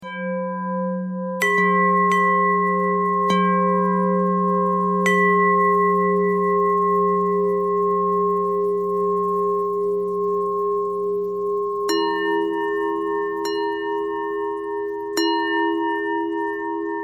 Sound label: bell bend threads